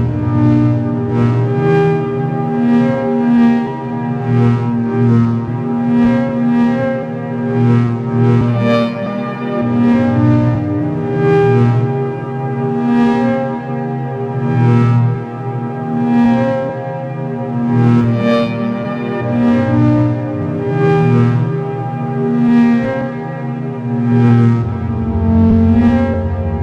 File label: Paging